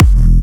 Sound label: Kick; WAProd Free Kick Samples 2018; WA Kick Pack 6